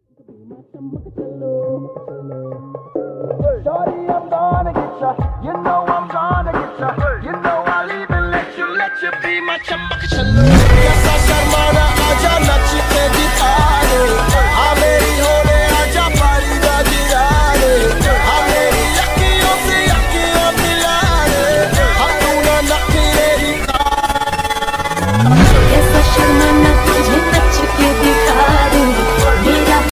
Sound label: remix ringtone english x hindi remix ringtone